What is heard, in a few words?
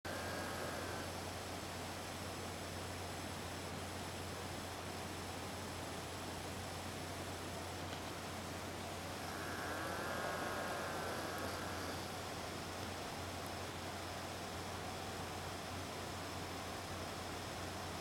Moo